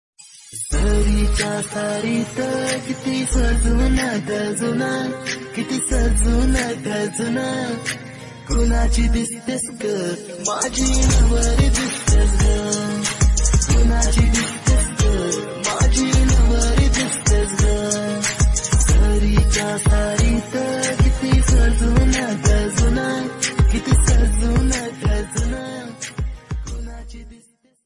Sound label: Marathi Ringtone